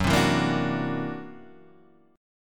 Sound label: C 9th Flat 5th F sharp